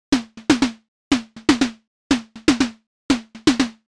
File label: snare